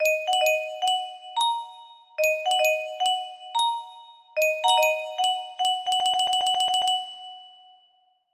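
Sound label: ding, music box melody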